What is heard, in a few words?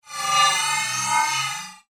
Alien Vocalization, Scifi, Alien, Vocalization, Electronic, Science, Fiction; Aliens